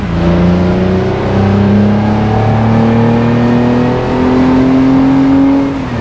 second, vehicles; gmod; tdmcars; wrangler

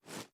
sm uzulu reload empty pullback; reloads; iw8; gmod

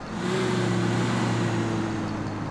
rev short loop, vehicles; landstalker

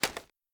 reload empty quick end, weapons; gmod; viper

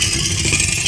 blowout, tire; gmod; svmod

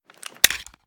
inspect magout, reloads; gmod

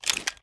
inspect

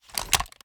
sm uzulu reload start; gmod; iw8

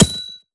frag physics bounce grass hard; gmod; viper

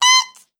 blinker switch off; gmod; svmod

